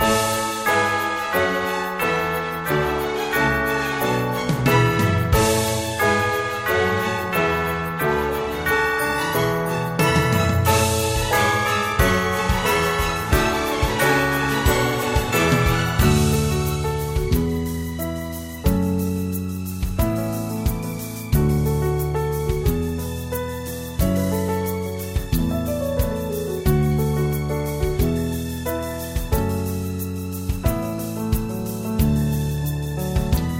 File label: restaurant, bgm; Delicious Emily's Wonder Wedding